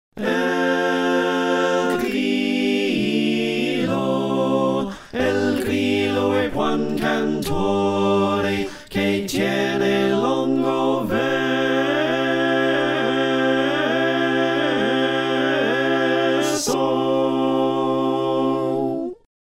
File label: Slow SATB, choir